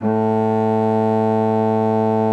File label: A2, bassoon; Xsample VOL-6